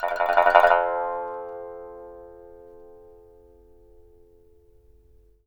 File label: SAN XUAN, F3; Spectrasonics; Heart of Asia